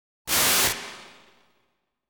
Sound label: TRAP Gas Bomb